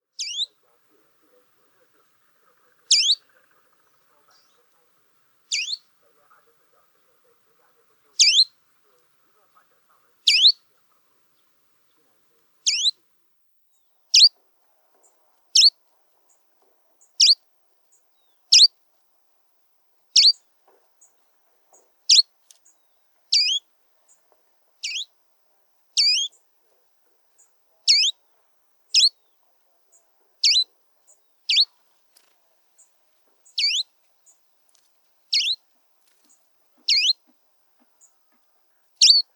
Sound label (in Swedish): kungsfagelsangare; fagelljud